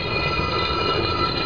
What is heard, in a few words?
move stone; Heretic II